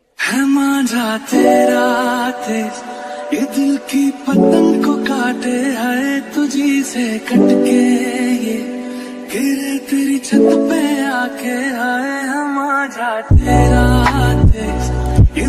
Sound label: bgm song ringtone kannada love bgm